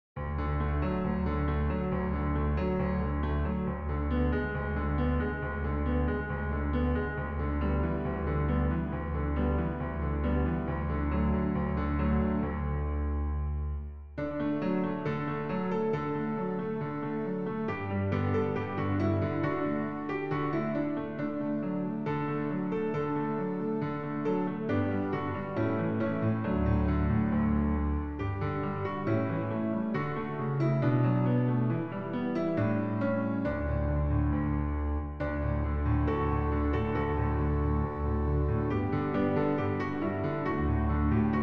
backing; New Hope Music

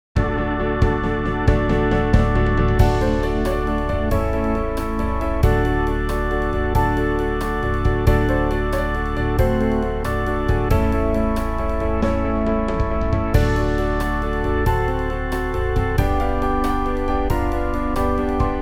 This Day Is Holy blues backing; New Hope Music

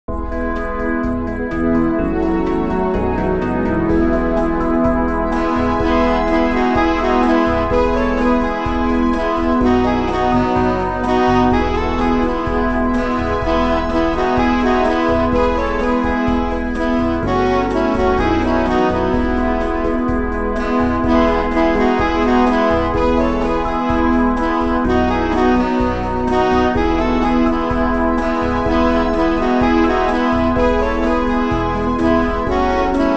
instrumental; New Hope Music

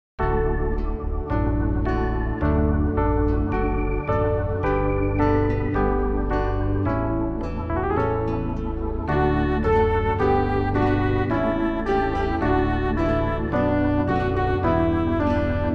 instrumental; New Hope Music

